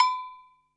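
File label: Drum Analog Kit Agogo Low, Yamaha RM1X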